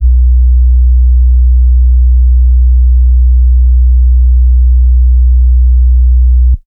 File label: toner; Heartbeats